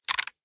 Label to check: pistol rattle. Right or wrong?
Right